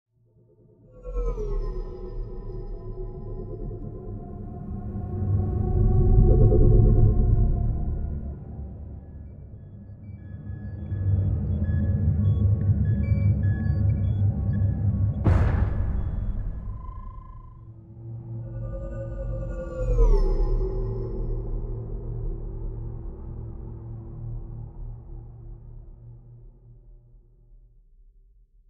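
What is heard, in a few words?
Titles Loop sound effects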